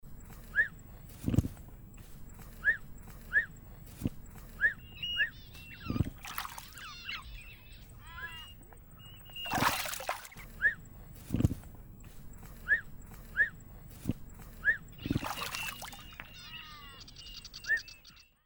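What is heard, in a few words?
ostrich voice; straus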